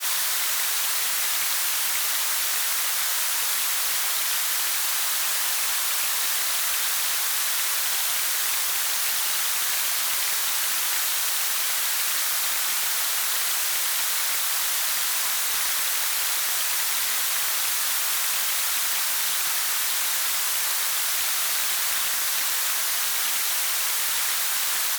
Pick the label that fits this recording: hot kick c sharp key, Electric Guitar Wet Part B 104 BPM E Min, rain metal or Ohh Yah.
rain metal